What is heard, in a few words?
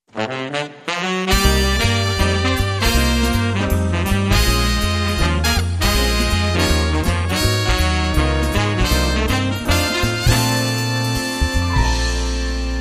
Jazz Brass